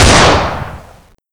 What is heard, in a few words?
fire; tfa cso; x-45